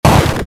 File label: Break Large